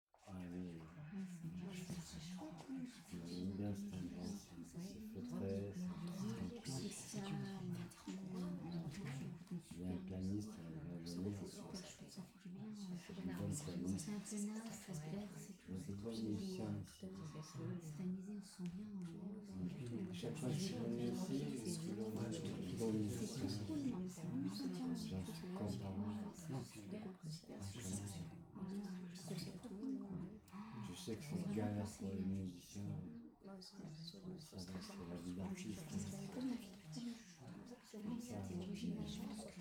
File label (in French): PUBLIC PETITE FOULE CONVERSATIONS DISCUSSIONS CHUCHOTEMENTS CALMES